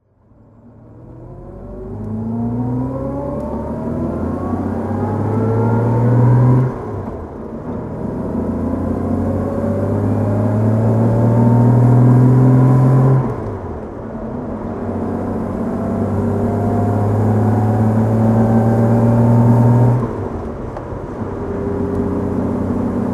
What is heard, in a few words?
jaguar etype 4.2 fhc driving